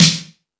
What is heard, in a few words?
Fusion Stix, Drum Machines